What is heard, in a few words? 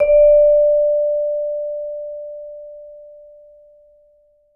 VMED D4, Vibes; Sampleheads - Dave Samuels Marimba & Vibes